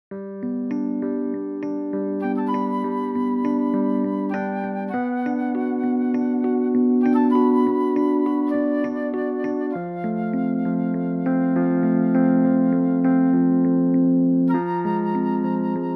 Playing Jazz Drums

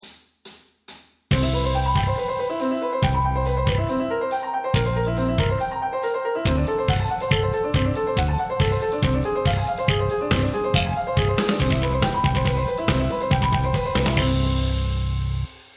Common Tone Calamity Fast

